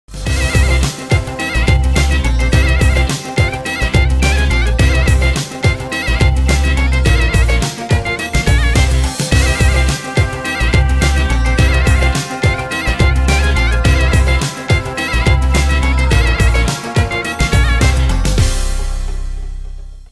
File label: Instrumental